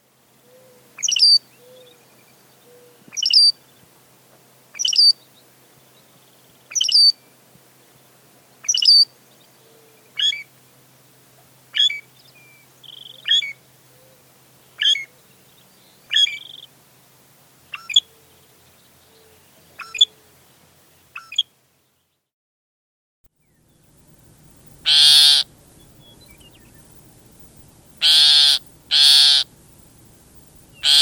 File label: Loggerhead Shrike